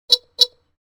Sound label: Scooter horn short multiple times